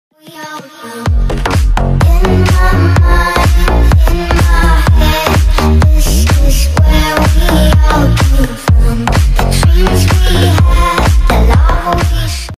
alert sound; twitch; follower subscriber

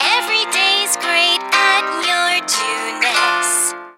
jingle; junes

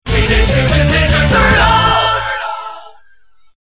act clear; tmnt 2003 gba

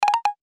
sound bip alert

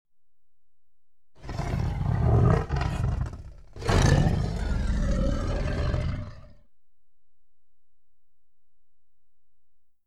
tiger light roar

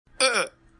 male hurt sound

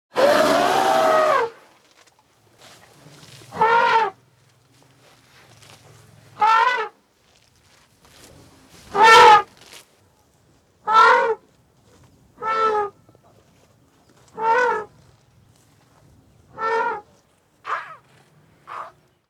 Elephant Trumpets and Cries

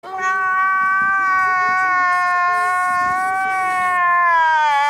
CRYING MEME; vlog transition

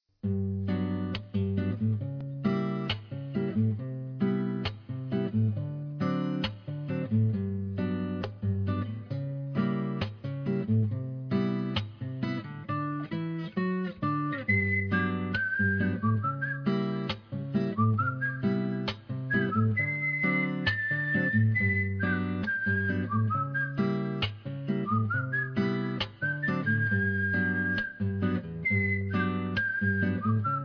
deadly premonition whistle theme

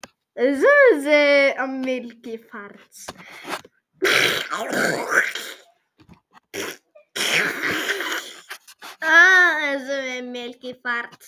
milky farts

